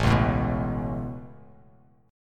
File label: A Major Add 9th A Bass Standard, chord